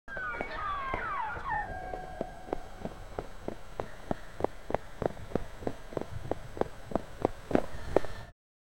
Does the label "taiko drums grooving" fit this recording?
No